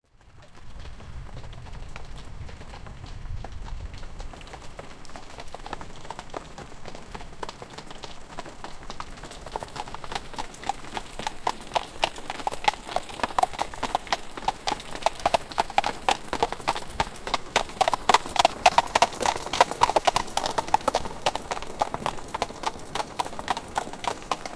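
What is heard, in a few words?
rain sound in the background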